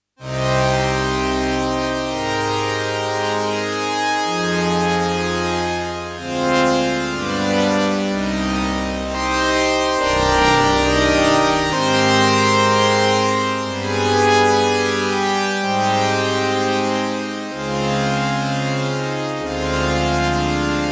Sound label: Pad SEM Highpass